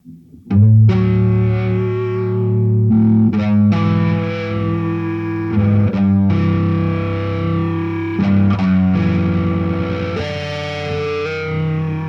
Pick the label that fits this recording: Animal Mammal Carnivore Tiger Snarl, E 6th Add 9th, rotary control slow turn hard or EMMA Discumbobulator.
EMMA Discumbobulator